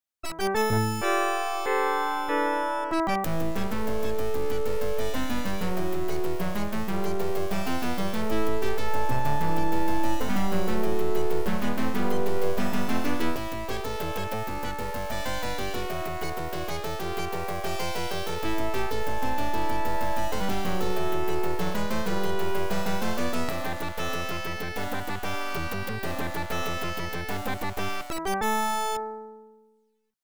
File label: Intro Ad Lib; F15 Strike Eagle II